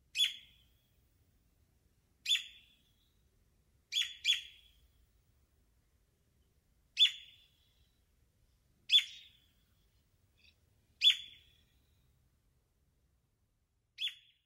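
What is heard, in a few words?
kaogurogabi, Masked Laughingthrush; kokubunji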